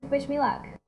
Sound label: beat